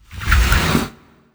Bloater Shrink

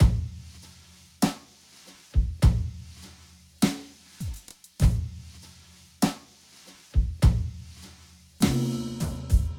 Speaker-Mic Slow-Jazz Loop

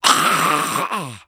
Grave Zombie Attack

